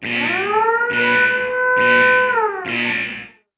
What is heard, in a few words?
sfx skill, ZombieSkill SFX; IdleRPG2024